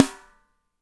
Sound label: SNR; Spectrasonics; Burning Grooves